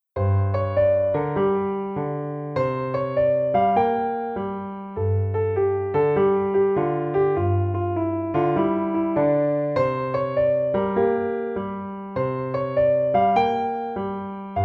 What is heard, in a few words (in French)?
Piano seul